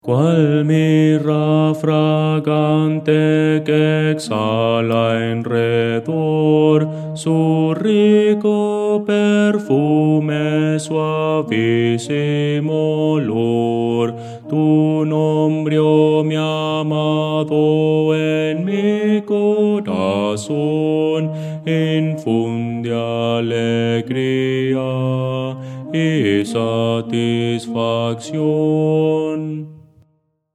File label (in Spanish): bajo, himnos cristianos